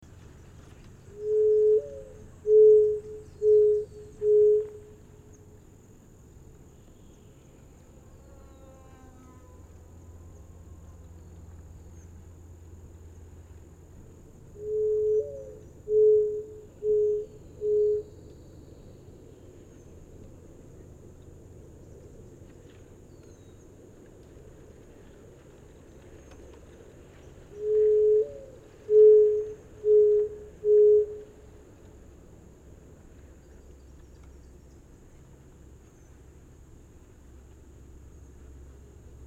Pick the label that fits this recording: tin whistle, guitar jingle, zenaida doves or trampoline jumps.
zenaida doves